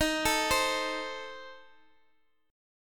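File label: C Minor 6th, E flat, guitar chord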